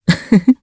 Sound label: laff; hibiki